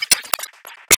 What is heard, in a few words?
Audio Corruption, Electronic